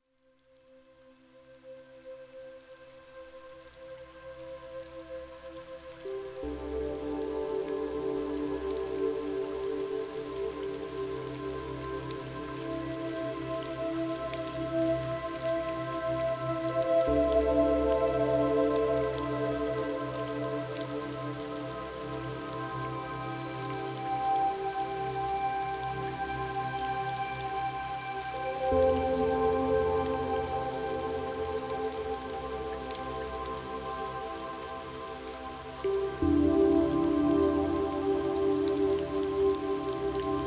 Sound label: ambient spa music